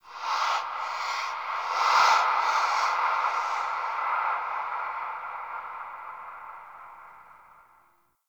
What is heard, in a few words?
Haunted Breath; Ambience; Warframe; Shadowgrapher